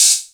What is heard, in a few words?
Hihat open